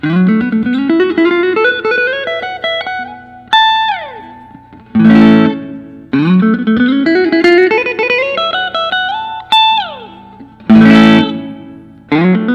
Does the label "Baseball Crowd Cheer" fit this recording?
No